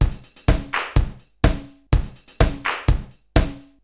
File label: Akai XE8 (125BPM), beats